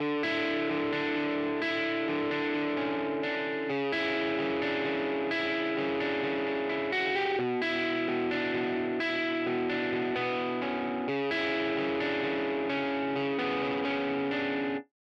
STEREO GUITAR LEFT GT DRY

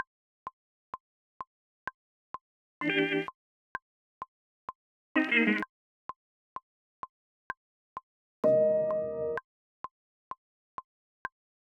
mix technique reverb piano off; replika

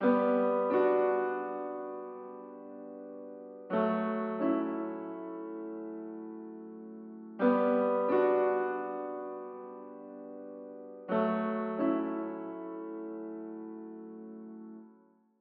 ELECTRO ROCK PIANO MAIN

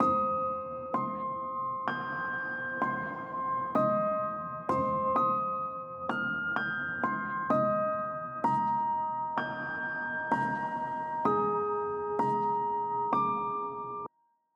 PIANO RAUM ON; reverb

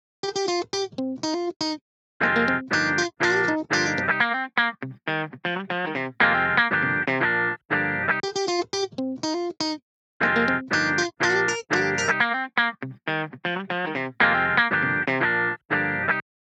GUITAR DELAY OFF

